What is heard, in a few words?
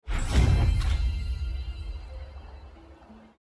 levelup